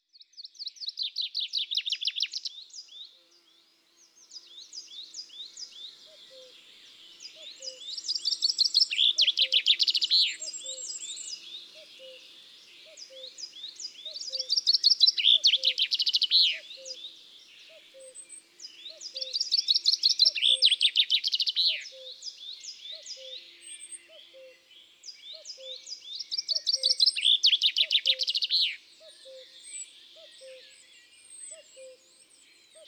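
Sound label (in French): Pinson des arbres, Chants d'oiseaux des bois et des forets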